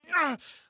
Hurt

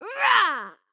Attack Large; Arya

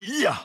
Attack Medium; Batman

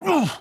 Hurt

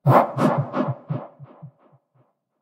Mamama Breathing

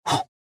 Punch

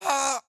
Hurt

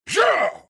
Attack Medium; Black Adam

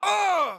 Hurt

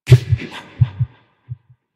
Heartbeat

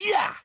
Jump; Jake

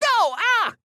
Hurt; Fern

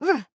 Attack Small

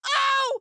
AHurt

